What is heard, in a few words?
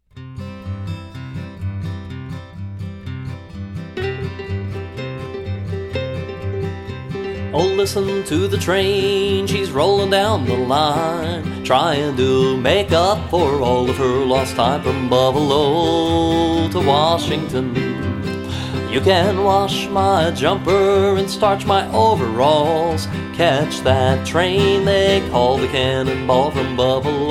Blues